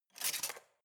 cutlery large multiple drawer grab

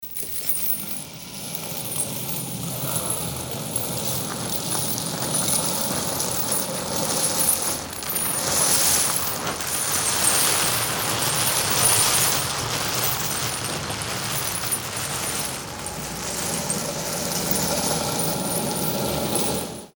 metal chain

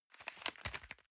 envelope swap medium

